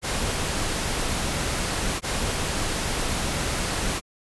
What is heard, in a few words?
pink noises, Channel ID (L R)